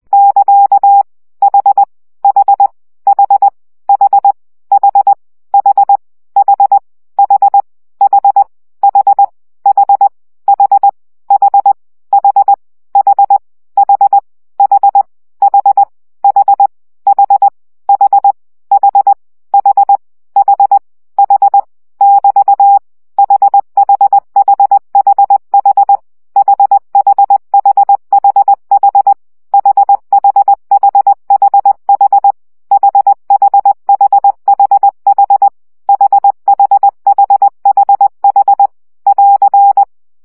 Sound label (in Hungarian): CW gyakorlas h 17 baud